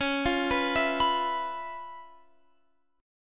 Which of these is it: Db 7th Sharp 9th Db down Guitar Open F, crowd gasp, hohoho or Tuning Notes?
Db 7th Sharp 9th Db down Guitar Open F